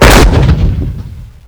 tiger cannon fire; WAR

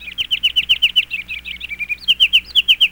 kinglet; WAR

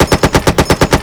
m3 loop; WAR

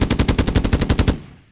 mg-42 fire; WAR

